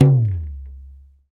African Perc

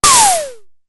DF-500 Misc, Drumfire

